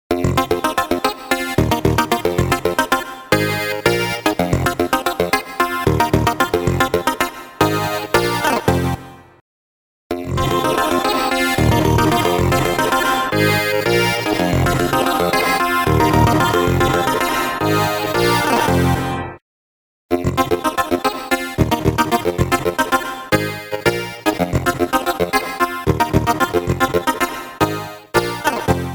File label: Polyphonic Synth, Transient; AtomicTransient